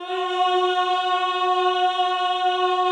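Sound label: Choir F#3, Ehh; Eeh + Ehh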